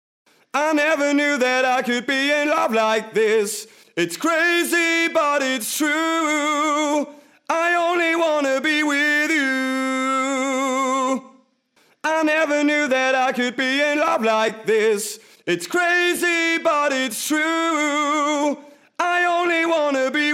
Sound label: Flex Pitch Test